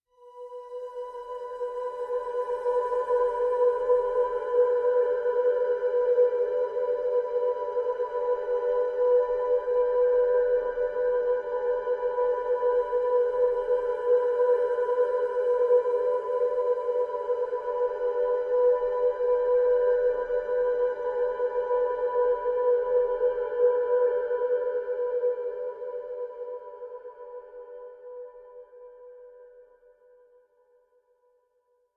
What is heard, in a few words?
Whispering (B); Brand X Music; Brand X Music Catalogue - Editor's Essentials